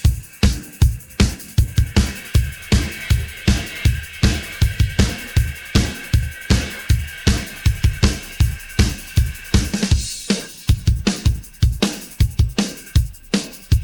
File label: pattern, drums